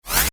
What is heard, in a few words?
multimedia max min interface sound metal max; Multimedia Interface ' Maximise ' Sound ( Metal Style Tone ) Ideal For Maximising Of Brows; Tones; Multimedia ’Maximize’ Sound; Sound Design; McKinneySound